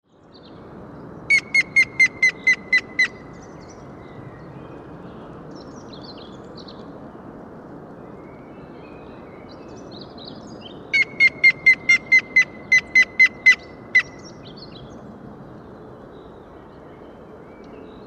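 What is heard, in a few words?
grey-headed woodpecker voice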